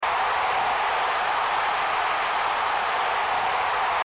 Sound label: crowd main; csgo